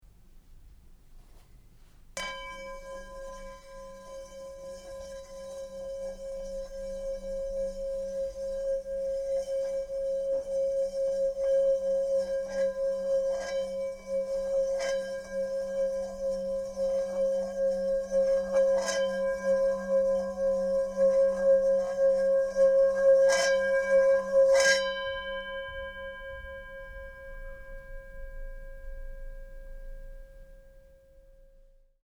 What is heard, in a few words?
swirling T bowl